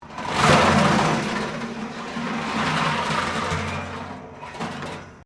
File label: METAL BIN SCRAPE WIRE FENCE; Metal (Sound Effects:Materials)